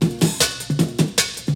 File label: BREAKBEATS, TECHNO